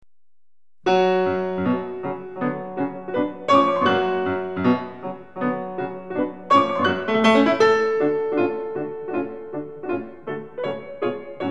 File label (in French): Trompette Et Tambour